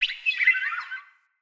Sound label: Ext Close Bird; Midrath Island